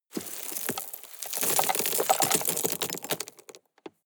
drop rock debris medium

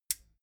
metal button stereo click power off

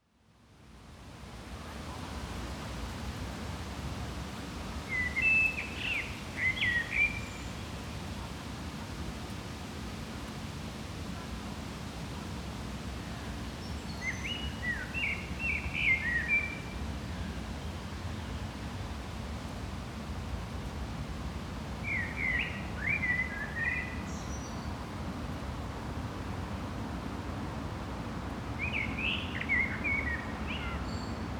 park close birds traffic wind

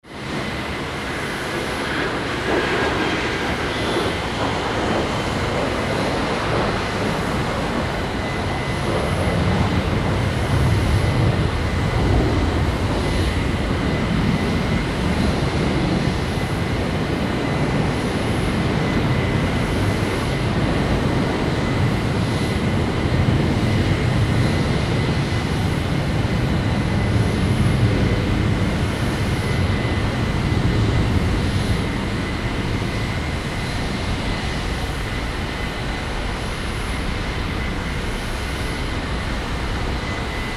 hangar atmo engines running

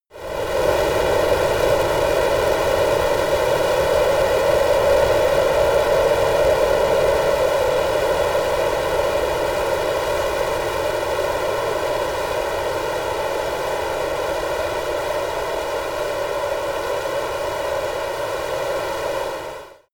military jet f-22 raptor turbine slow down

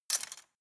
cutlery plastic drawer place

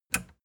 plastic button latch push disable

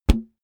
balloon hit hand

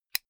zippo fire close hard

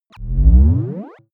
turn on off

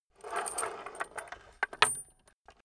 metal scrape on wood jingle

